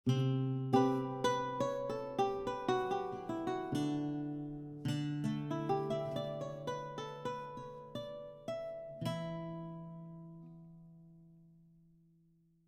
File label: baroque guitar; gamerip